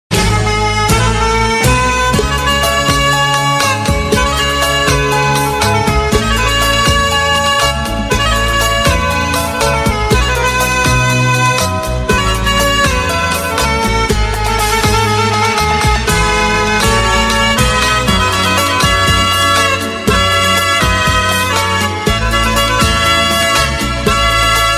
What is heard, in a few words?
iraqi romance, ringtones classical